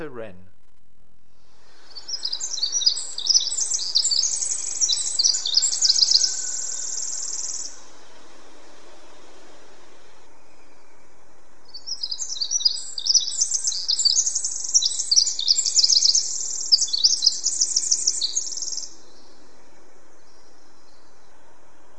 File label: Winter wren, calls